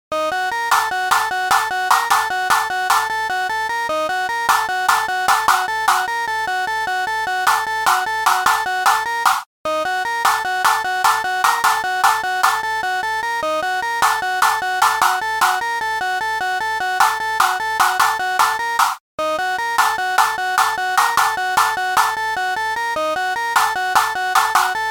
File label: Beepbox